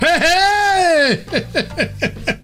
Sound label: hehe hehehehe